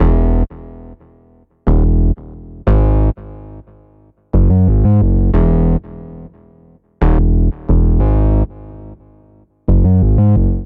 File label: Bass Ab Major 90 bpm, Bassline; MIDI Clips; Tonal; Hacksaw; Ableton; Factory Packs; Chop and Swing